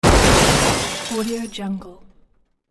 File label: car crash; urban sounds